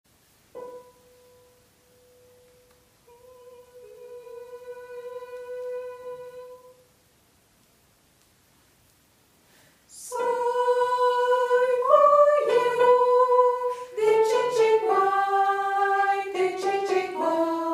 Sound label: Soprano; Soy Boyero